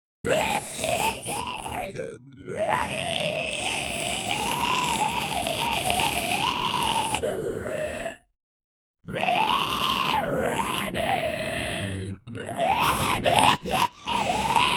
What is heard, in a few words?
a zombie is trying to, zombie